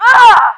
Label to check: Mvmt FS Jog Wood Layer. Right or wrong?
Wrong